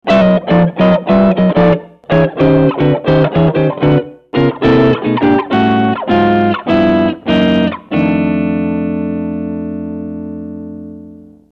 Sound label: Clean